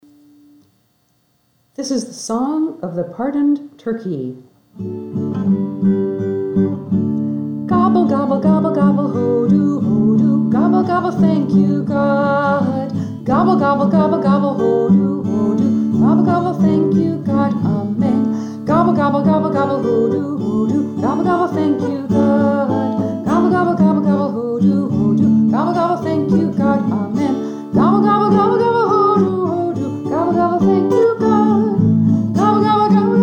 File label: Song